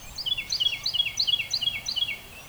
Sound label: Carolina Wren; woods